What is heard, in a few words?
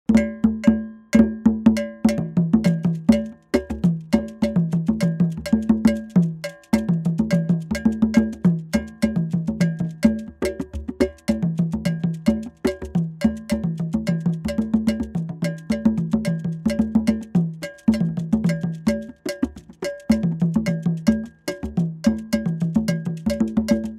Percussion